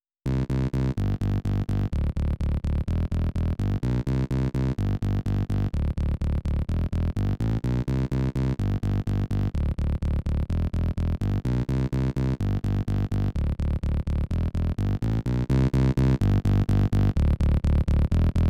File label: bass stem